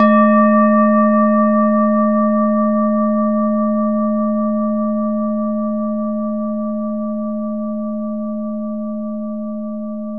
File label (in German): bengalen klangschale